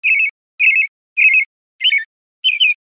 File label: bird twitter